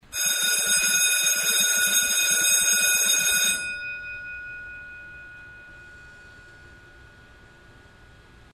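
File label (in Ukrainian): Svyatkove Dzvonik shkilniy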